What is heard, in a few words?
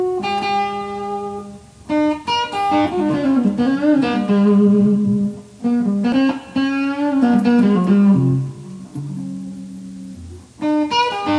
blues